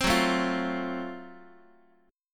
F sharp Minor Major 7th Flat 5th F sharp down Guitar; strummed